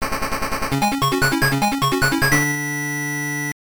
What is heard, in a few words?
Level Complete; Alex Kidd in Shinobi World